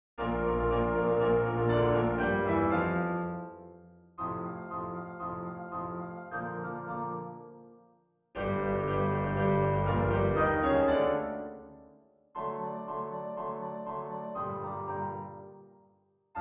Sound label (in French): hymne national italien; mameli goffredo